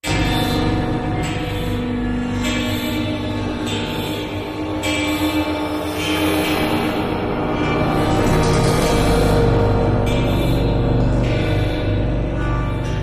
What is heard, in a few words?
Cymbal Doubt High Metal, eerie ambience